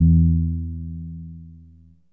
mine, Bass

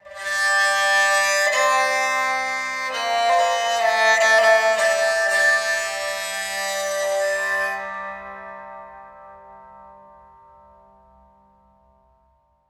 keys zithering, Sad Loops

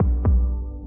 heartbeating, Drum loops

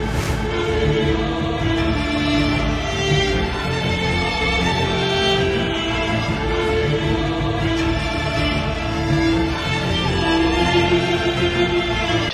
strings distortion, Sad; Loops

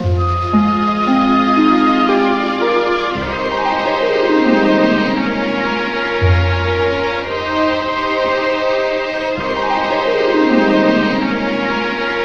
flute primo, Loops, Jazzmatazz

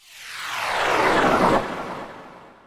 coming in, Zaps and Beeps

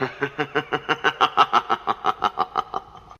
laugh

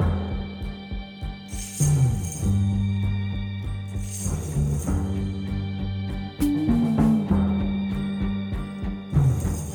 guitar, Jazzmatazz Loops

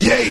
yay group of men; Voice

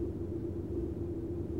winds; Rumbles

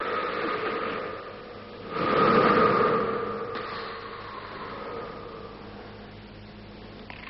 hallway breath, Rumbles

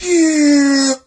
eeeyah male, voice; ooh ahh

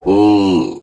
"ooh", voice

